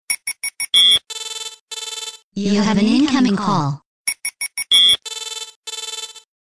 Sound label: Incoming Call